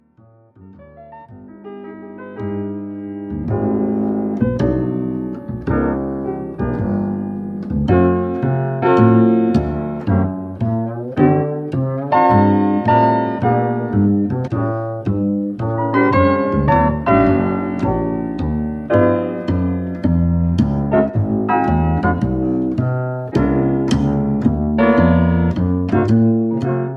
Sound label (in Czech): Kontrabas swing piano